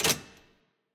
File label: Lock Success; Puzzle